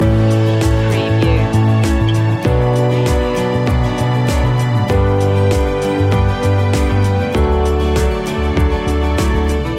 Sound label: dreamful loop